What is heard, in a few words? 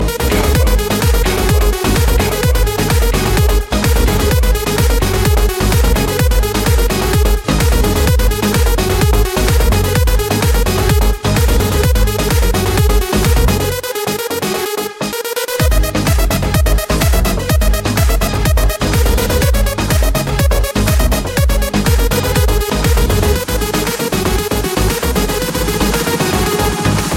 nagin electro mix